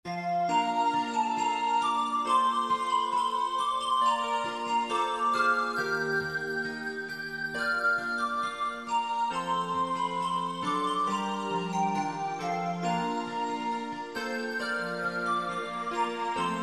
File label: Auld Lang Syne